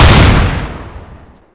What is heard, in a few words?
xm1014 fire; weapons; covert